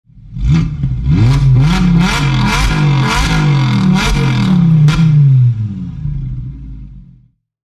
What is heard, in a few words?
exhaust pipe engine